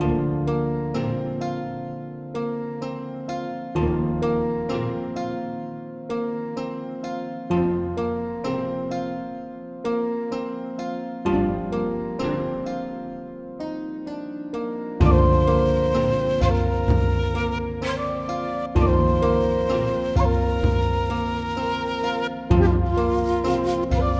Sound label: japanese calm